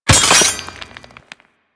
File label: Dagger Stone Impact; Dual Daggers; Hit Effects; Weapons